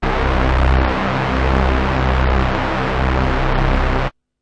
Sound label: bass C1; multisampled; must destroy you bass 1